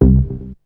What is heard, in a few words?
BASS; SoundFactory; SMPMANIA